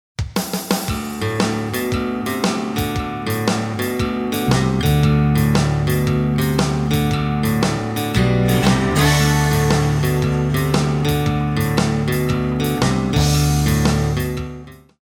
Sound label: country